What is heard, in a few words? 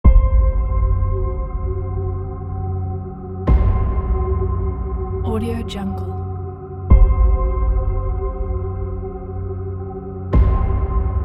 afraid thriller